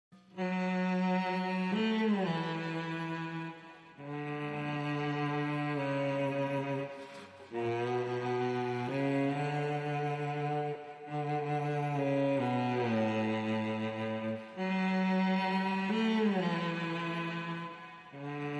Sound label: Strings Clean